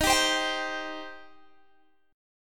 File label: Eb 6th Eb down Guitar Open G